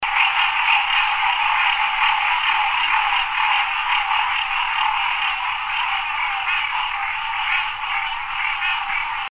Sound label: green treefrog call; chesapeakebay